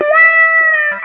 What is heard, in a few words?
GUITAR; InVision Interactive; 40 Oz Phat Slammin Hip Hop